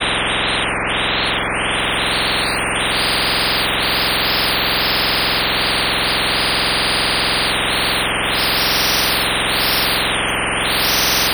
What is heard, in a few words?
negative; forest two